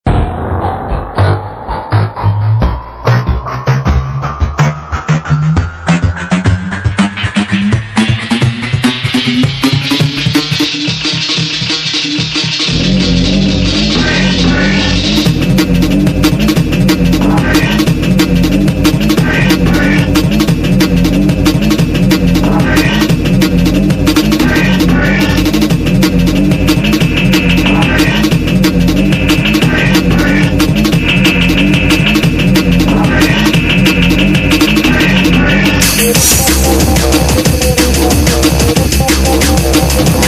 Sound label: basswarp